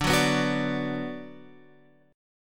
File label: D 6th D down Guitar Open F, chord; D6 strummed